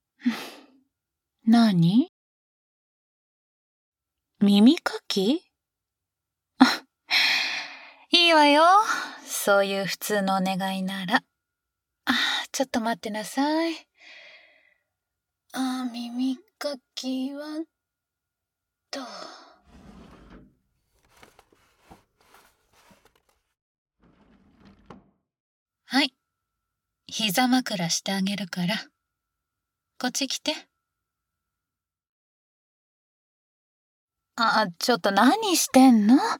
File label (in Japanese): 耳かき&授乳添い寝 水音系SEなし